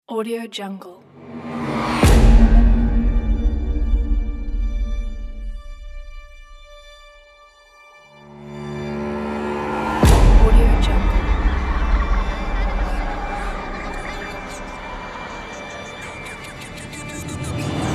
horror; skyiceberg

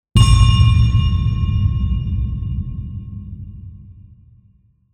low metal hit; archisound

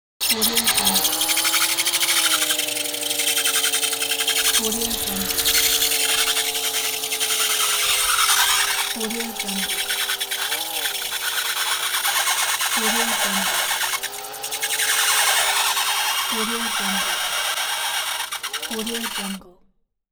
drilling on metal

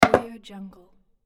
flower pot put down

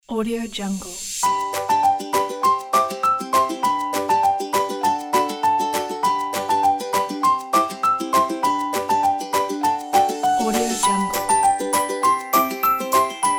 happy children music

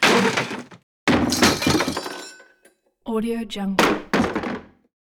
chair wood tossed lands on floor metal element

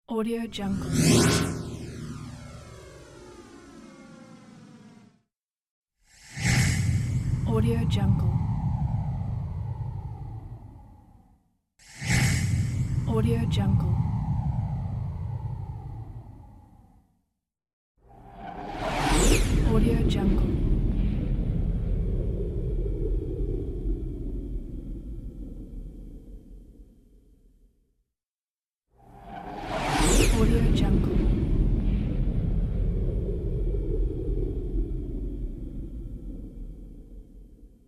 whoosh

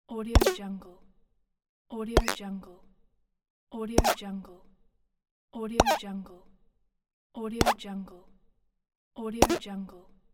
disgusting buttons; creatorium-sound-design

